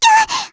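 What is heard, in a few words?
Vox Damage, Korean; Lilia; kingsraid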